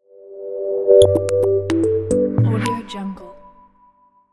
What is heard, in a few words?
modern logo